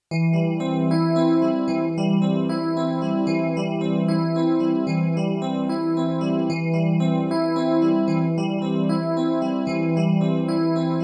Unchained Arpeggio

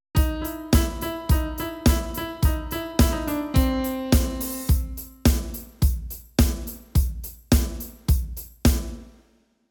Cider Pad

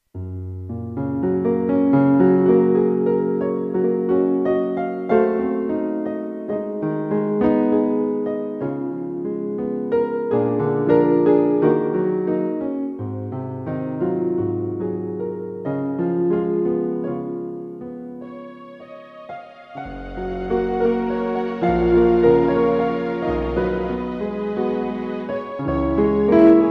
Piano Strings

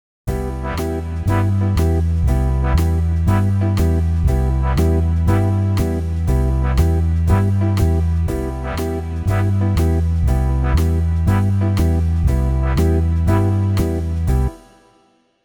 Bass

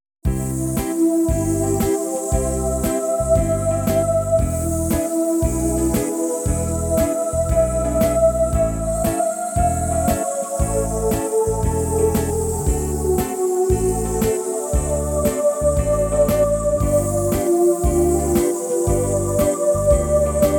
Acoustic

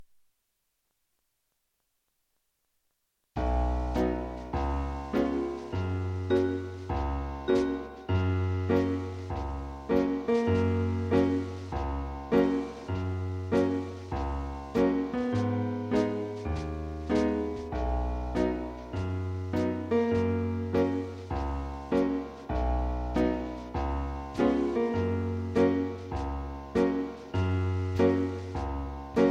Piano

